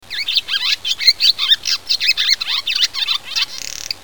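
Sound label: barn swallow; tubame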